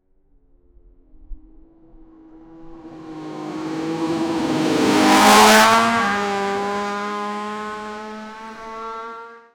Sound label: sound of race car driving